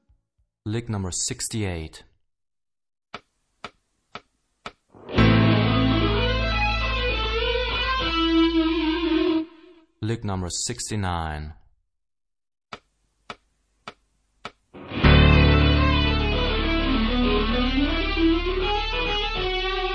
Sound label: Rock guitar; Rock gt Secrets